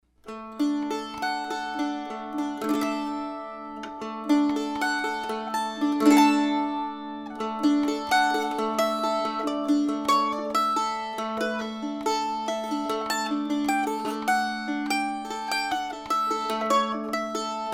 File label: x-brace mando; X-Braced A-Style with Swiss Spruce Top and Red Maple Back & Sides; mandolin; Terry Lewis